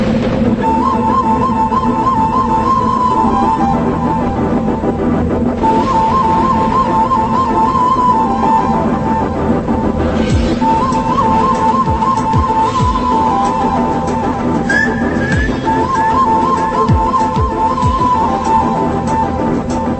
lovely music

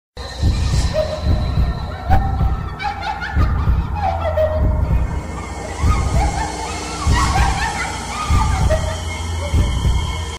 laugh sms tone; ringtones; games

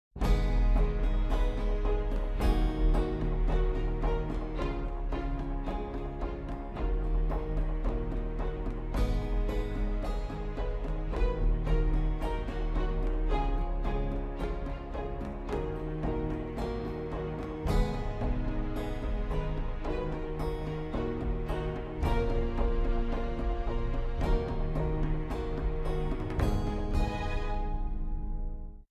Soft Gothic Ringtone

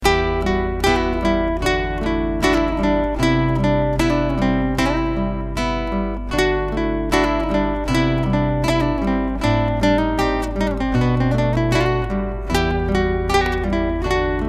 Arabesk Guitar

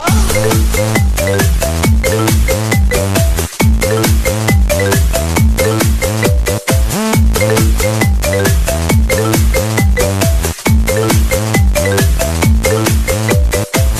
carnival marimba, ringtones

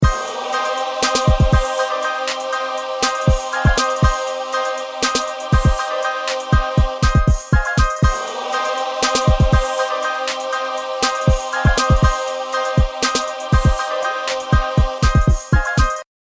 Beats

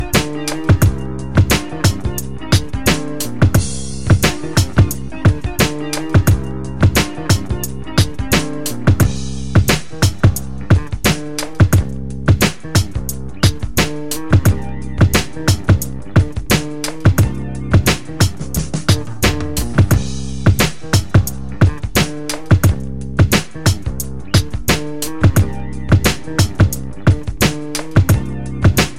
classical hiphop

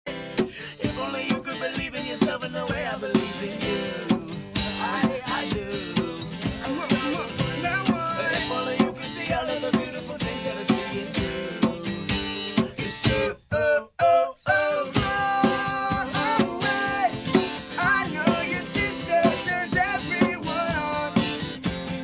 acoustic

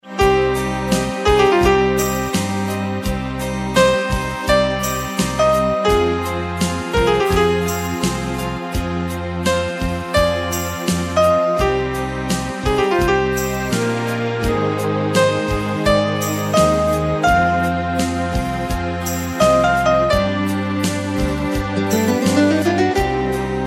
Very Romantic Piano

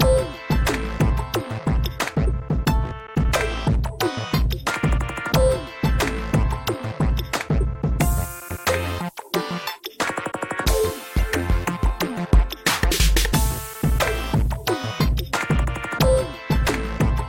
hot hip hop

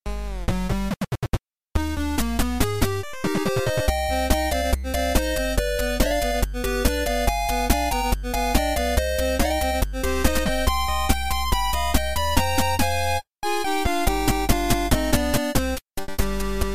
8bit long, ringtones

